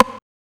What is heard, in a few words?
RIM; X-Static Goldmine 1